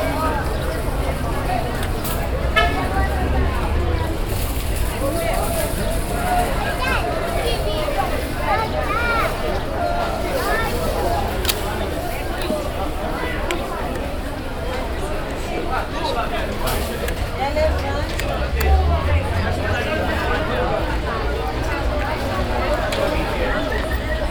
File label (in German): aufm markt, Brasilien